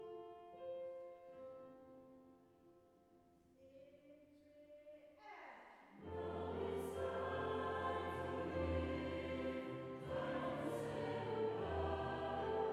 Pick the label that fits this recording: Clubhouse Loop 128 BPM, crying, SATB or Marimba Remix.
SATB